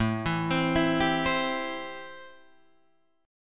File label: A-Minor 7th A Guitar Drop D